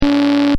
Robot move loop, Robot: Robotic Movement Style Effect; Robots; Stuart Duffield